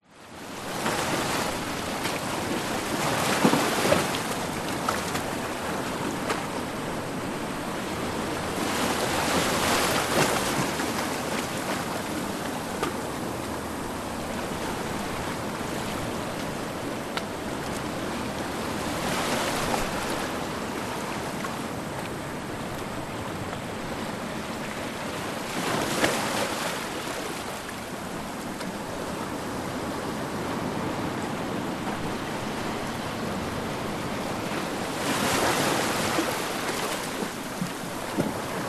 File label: ocean waves lap onto rocks shallow rock pool surf close by cicada; nature